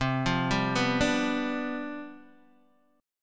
C Major 7th Suspended 2nd Suspended 4th C down Guitar DADGAD, chord